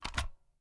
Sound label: reload empty mag rotate; gmod